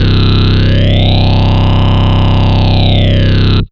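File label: Ensoniq ZR-76 Distorted Phaser Bass C1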